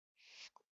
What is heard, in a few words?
Drag slide